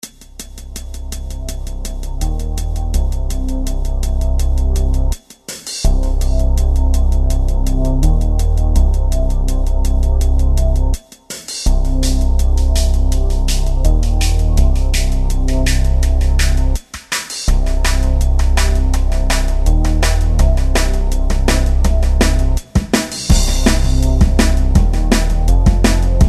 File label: Drumnpiano